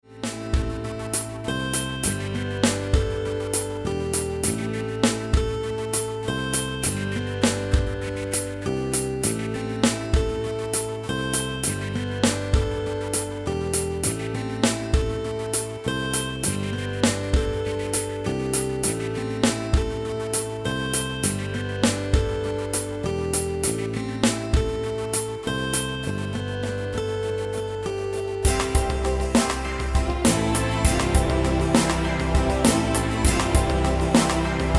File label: music